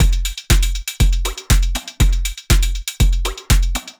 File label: Beat 120BPM Low Deep